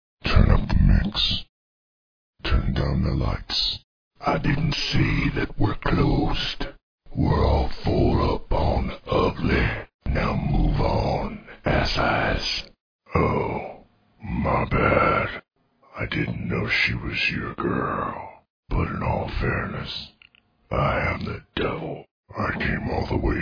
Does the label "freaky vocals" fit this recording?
Yes